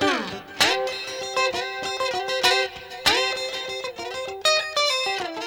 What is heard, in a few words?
TWNSHIP, S.AFR.GTR